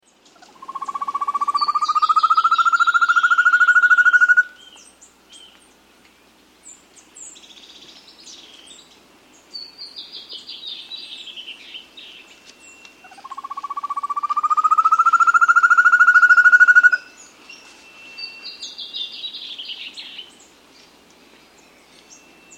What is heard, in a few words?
Rufous-Tailed Antthrush; S. America